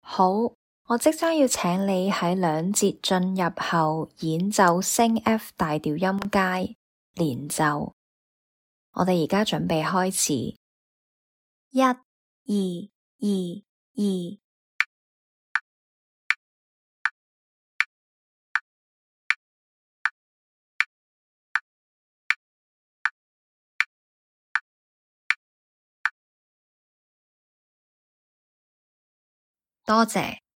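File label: F sharp major scale legato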